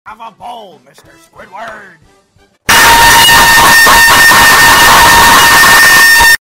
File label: bowl